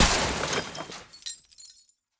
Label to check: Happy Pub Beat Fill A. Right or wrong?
Wrong